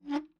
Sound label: Door Close; openclonk